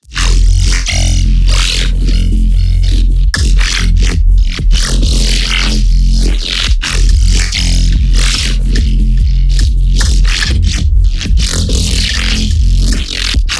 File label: neuro, bass